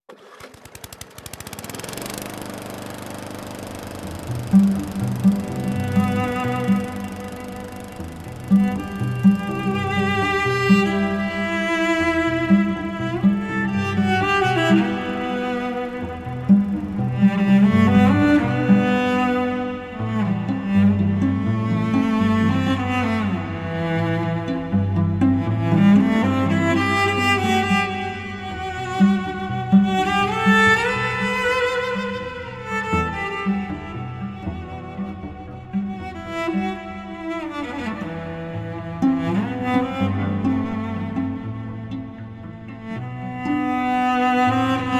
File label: Trio for Lawnmower and 2 Cellos